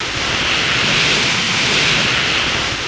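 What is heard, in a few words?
scrape; Splashdown; XBOX; Terrain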